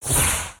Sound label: Short Shock; ambience